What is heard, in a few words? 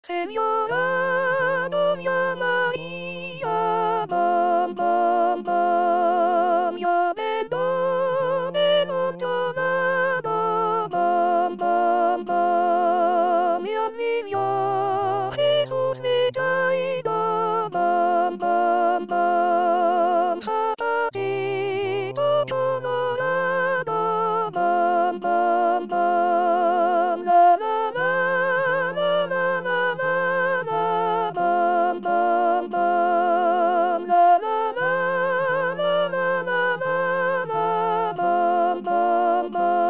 soprano 1 senora dona maria